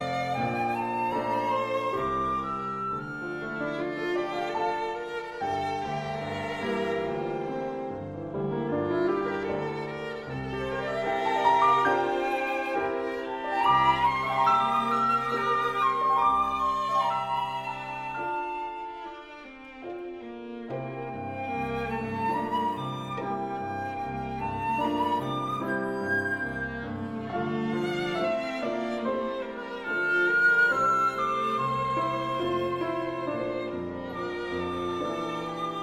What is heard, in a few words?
for flute violin viola and piano; suite dans le style ancien